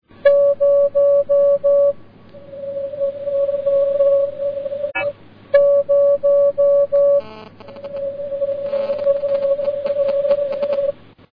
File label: echo